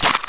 silencer off, m4a1 silencer; cstrike; csgo

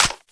clip tap, famas; cstrike; csgo

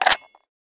clip in; ssg; cstrike; csgo

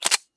famas clip in, weapons; cstrike; csgo

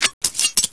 knife deploy; cstrike

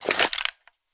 deploy, weapons elite; cstrike; csgo

